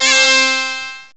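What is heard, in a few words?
KOTO ENSEMBLE; GUITARS2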